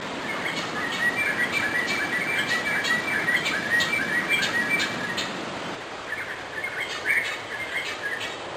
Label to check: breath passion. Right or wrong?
Wrong